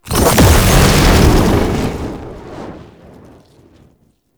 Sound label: Dash Start; Roathe; Warframe; Tau; Abilities